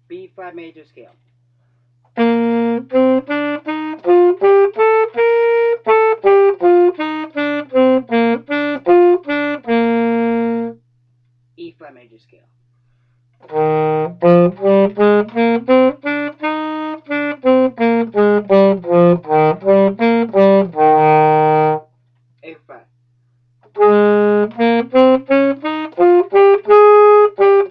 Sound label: Saxophone Scales